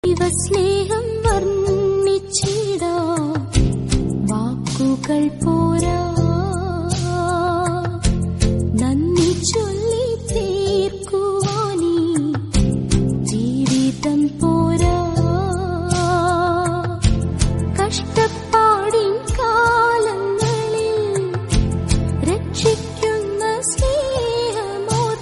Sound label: devotional Malayalam ringtone